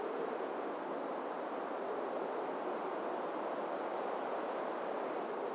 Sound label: exterior; soundscapes